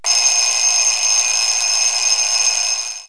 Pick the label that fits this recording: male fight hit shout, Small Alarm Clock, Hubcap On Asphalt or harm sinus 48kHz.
Small Alarm Clock